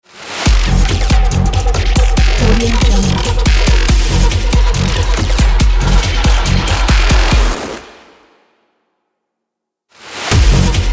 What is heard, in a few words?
glitch beat intro logo